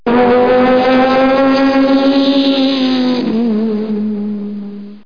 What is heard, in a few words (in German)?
vorbei; robossy